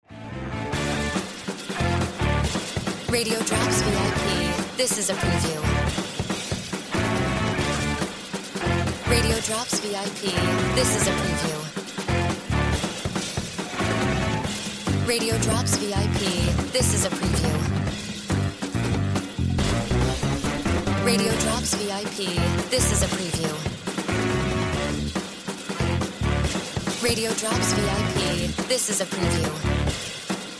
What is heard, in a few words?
Music Bed